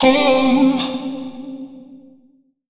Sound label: Omnivox oneshot C, Vocal; Slate Digital Omnivox Vocal Sample Pack